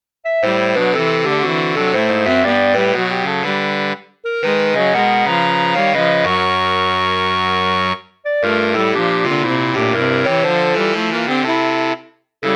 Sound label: sax quartet